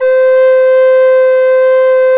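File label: MOECK alto C 3; SoundBytes - Early Patches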